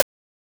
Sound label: Rimshot, techno drum